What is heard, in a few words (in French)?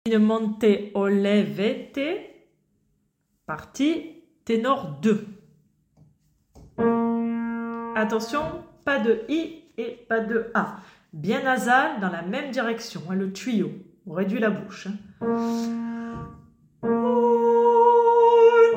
tenor; In monte olivetti